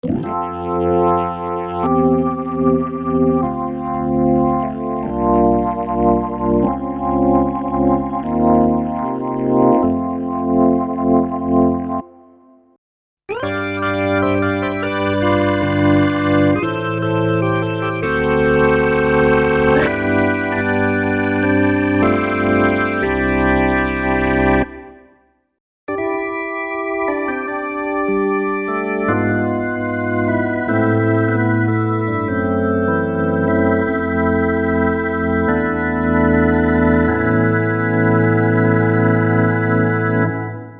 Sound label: LIVE Organ; live instruments